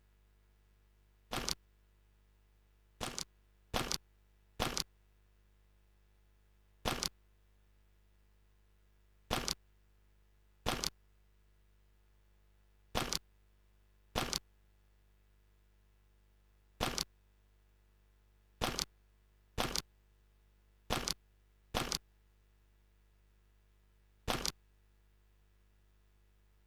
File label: stereo instr loop